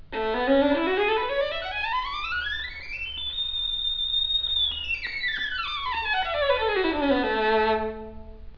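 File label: violin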